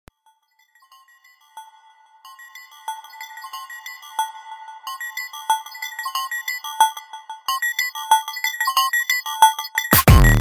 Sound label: Bell Beat